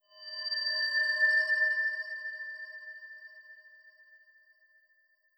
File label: Tablet Start; Legend of Keepers